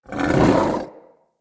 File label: stag headbutt; Powers; Stag Pact